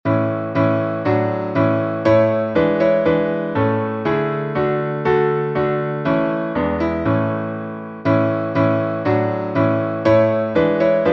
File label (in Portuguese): salmo playback